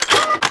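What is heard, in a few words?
gunner gun attack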